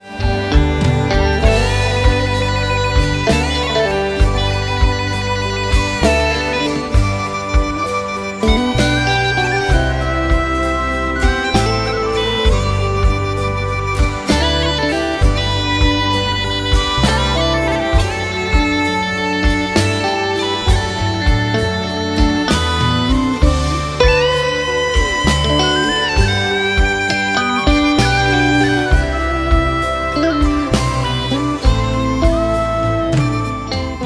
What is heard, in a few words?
Fender tele Sunburst; Malaga 2013